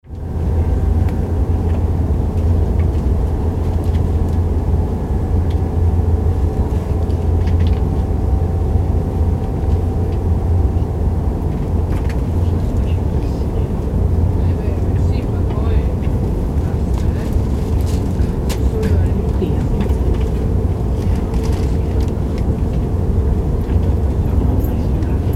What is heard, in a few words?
train main line cabin inside calm